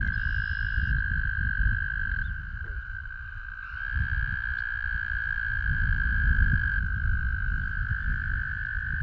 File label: american toad